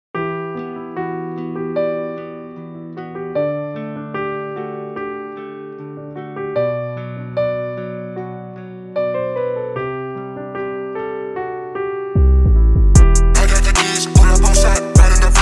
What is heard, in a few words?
rap